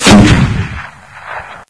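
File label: m41a grenade launcher; weapons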